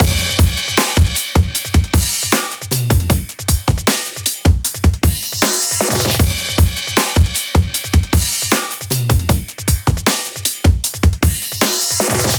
Resampled Breaks

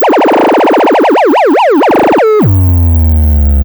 FX MS20

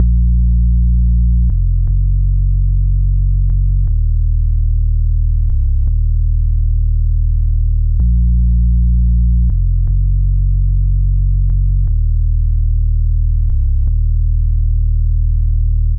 Drop Sub Bass A-m 120 BPM

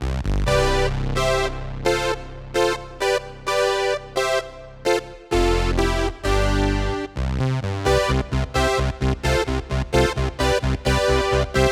Future Synth 140bpm

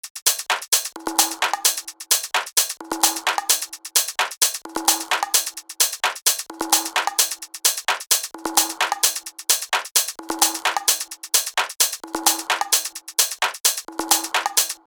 Top Loop

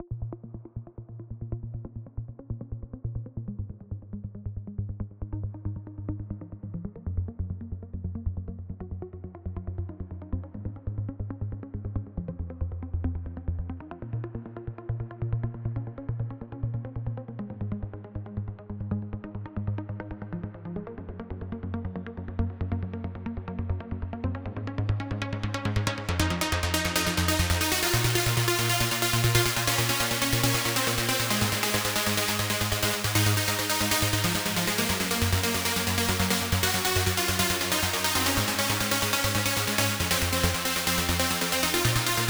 MAIN LEAD (CUTOFF)

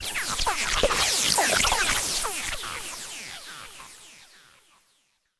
Noise Fragment

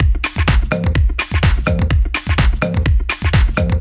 126 DRUMS FULL

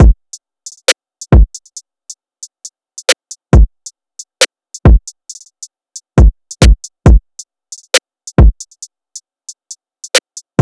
Drumloop 136bpm Full A-m

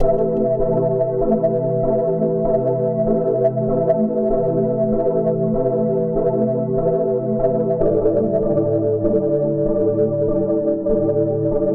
123 C Digital Ambience

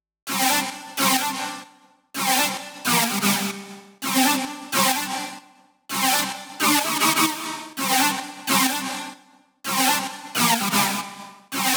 128 D Drop

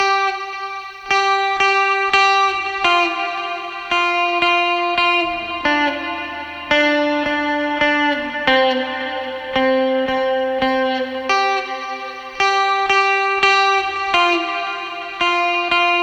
Guitar fx 85BPM Cmin Acoustic RNB

